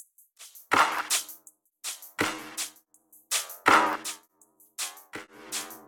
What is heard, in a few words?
Beat weird; 81.5bpm; Pony Fan Music Remix Stems